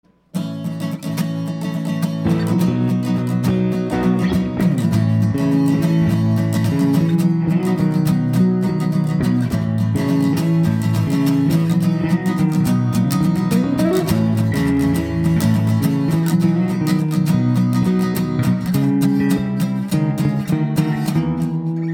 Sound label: acoustic mini